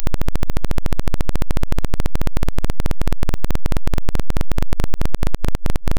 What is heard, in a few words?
async FM